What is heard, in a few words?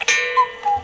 expired; crazyeights